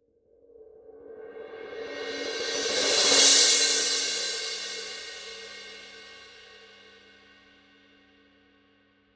18 thin crash roll; formula 602 classic sounds

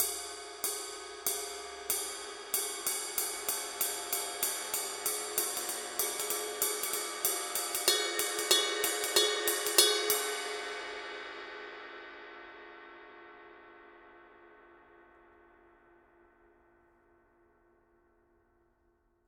dark energy ride mark ii pattern; signature dark energy 21

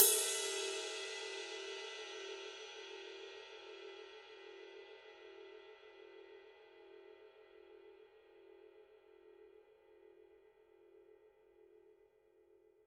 signature precision 20 ride body, cymbales; Paiste

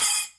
formula 602 8 splash choke; modern essentials

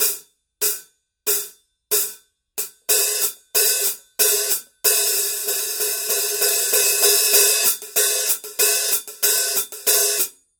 signature 14 sound edge hi-hat pattern

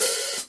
14 medium light hi-hat open

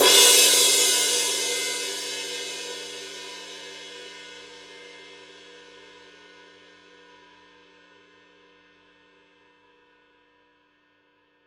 20 heavy ride edge; signature precision

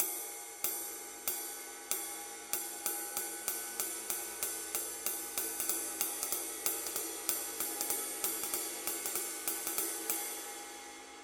20 medium flatride pattern; formula 602 classic sounds

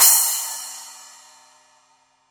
signature 10 reflector splash edge

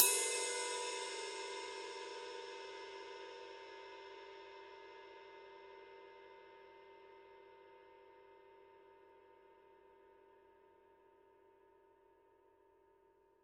signature precision 20 heavy ride body, cymbales; Paiste

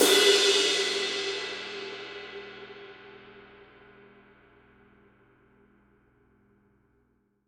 20 light ride edge

